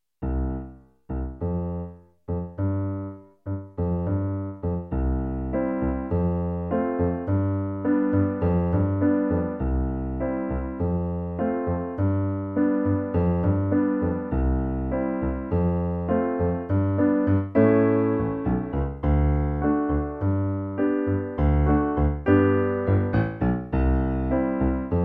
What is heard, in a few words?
Grease piano; musica I ESO 2015